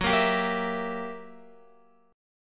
Gb 7th Flat 9th Gb down Guitar; Gb7b9 strummed; 4F,4A,5C,5G,5C,6E

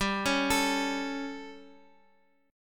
G Suspended 2nd Flat 5th, Guitar; Gsus2b5 arpeggiated; JGuitar

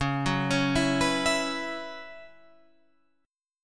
F sharp 7th Suspended 4th, guitar

